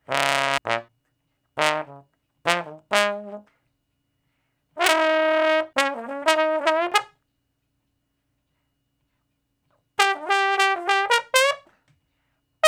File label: Trombone Straight (Bb)